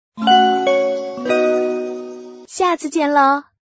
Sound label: poweroff; boot-play; allwinner